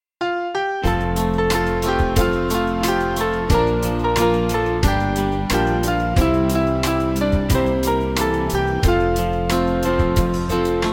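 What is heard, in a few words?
Music Backing Files for Churches; His Name Is Jesus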